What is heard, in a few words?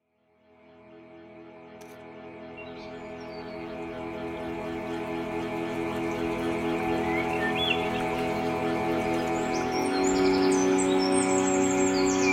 Relaxing Rain Sounds